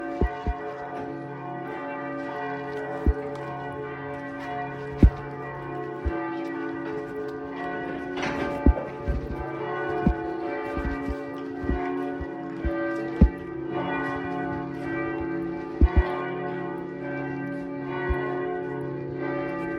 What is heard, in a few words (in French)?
RUFFEC 16 CLOCHES; EGLISE SAINT ANDRE